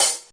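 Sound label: Yamaha RS7000 hihat cymbal, Drum machines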